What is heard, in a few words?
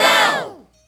Dow With Dip A, Vox One Shots